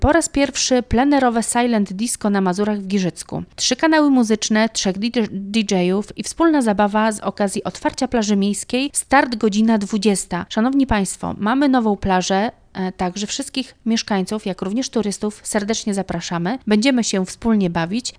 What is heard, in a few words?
silent disco